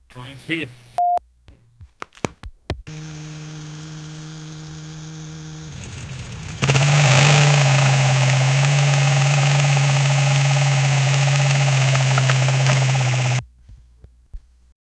dirt bike engine with a, accelerating

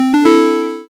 puzzle solved success sou

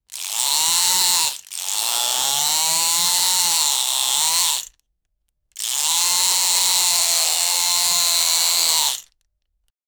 tools rapid drills screws; screwing

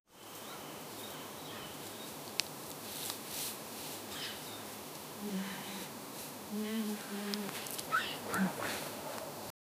loud sobbing baby heavy; market chatter

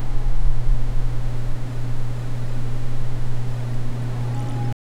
airy warehouse reverb

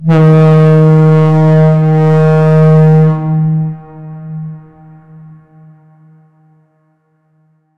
deep fog horn with lots, treble

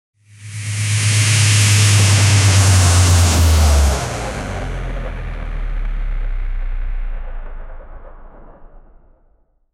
short sound effect for rocket

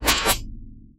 electronic sound that cha, animation

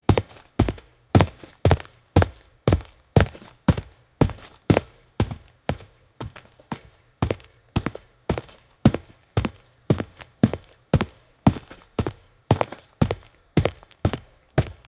very fast paced running, footsteps

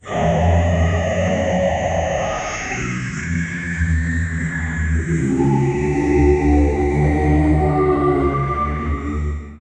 voice hell sound demons

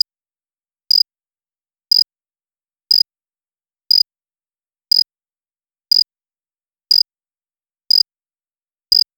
timer; 90 second

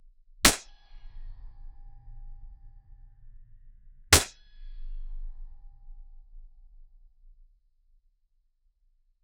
low caliber coil nailgun, bassy

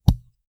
soft thud of a hand, slap

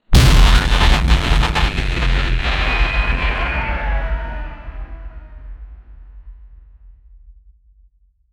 ancient cosmic explosion, cosmic

